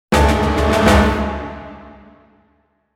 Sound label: Fanfare (dungeon defence); fire emblem echoes shadows of valentia original soundtrack